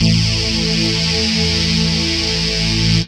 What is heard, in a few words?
chord A; Techno Trance Essentials; CHORDS